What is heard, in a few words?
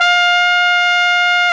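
trumpet, jazz; Roland L-CDX-03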